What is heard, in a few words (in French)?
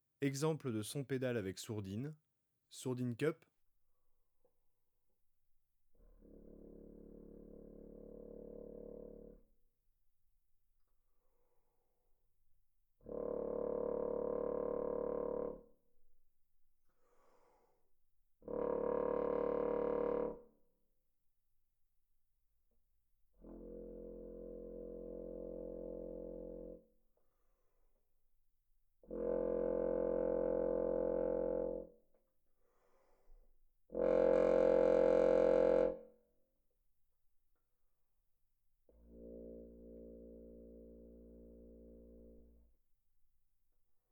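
exemple de sons pédales avec sourdine cup, trombone; Textures; Sons complexes sonores; traite orchestration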